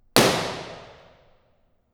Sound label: Impulse